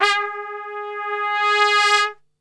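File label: G#2 trumpet, Brass Super Section; Best Service; TRP SWL; 90 s Sample CDs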